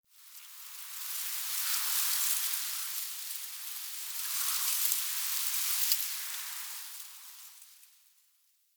Tree Pine Wind Leaves Relaxed Gust; Ambience; Trees

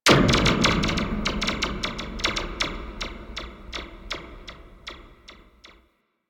Environment Cable Impact; Warframe; Teralyst; Ropalolyst

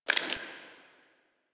Screen Glitch

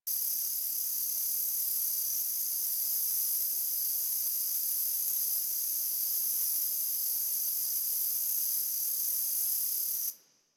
Insects High Loop E; Ambience; Props; Loops; Warframe; Eidolon Plains

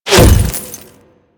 Glass Unbreakable Impact; Warframe

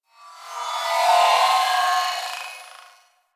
Metallic Textures; Ambience; Warframe; Corpus Railjack

